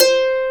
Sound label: pluck harp C5